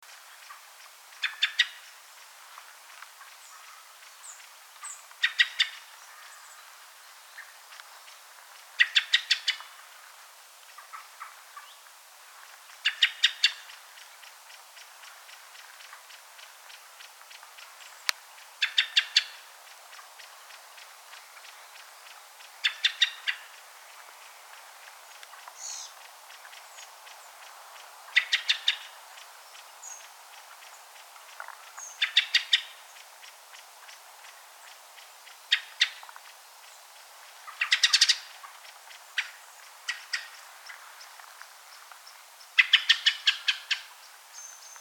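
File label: black-throated thrush voice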